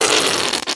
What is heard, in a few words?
whoopee